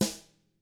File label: DW BR, DW BRSNR; ILIO; Double Platinum Drums 2